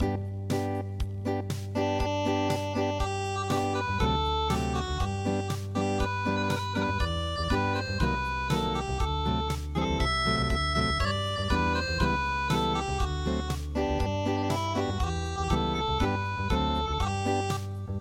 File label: the Soga brothers unpluged